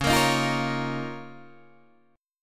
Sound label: Db 6th Add 9th Db, down, Guitar Open D